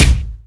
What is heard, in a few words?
rich high end kick drum c key